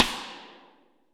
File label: rim; Best Service; Real Mega Drums VOL-1